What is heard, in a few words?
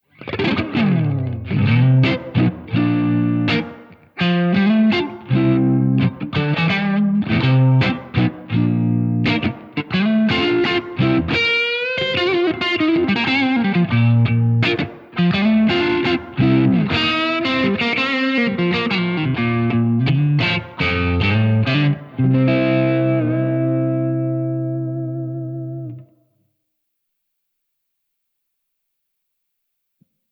Rootsy Slap; Riverside; Iridium; Cloudburst